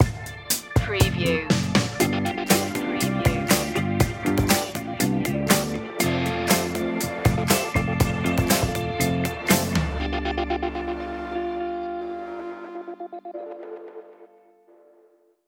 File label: easy funky; 15s